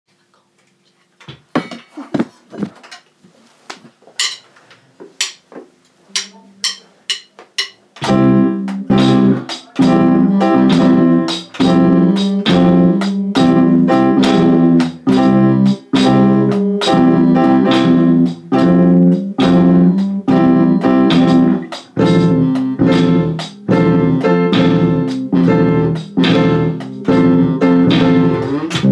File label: blues and rythem peice